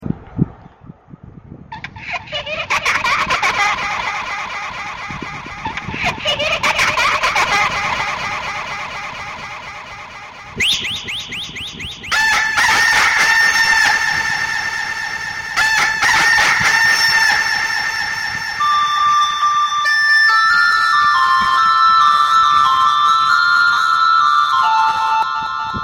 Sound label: Horror